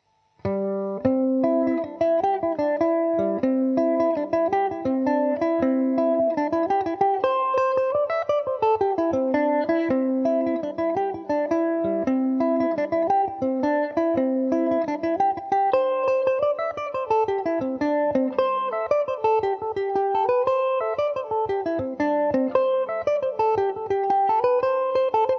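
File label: hole in the hedge C major mandobird revisited, mandolin; Epiphone Mandobird VIII electric mandolin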